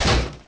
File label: hurt; t800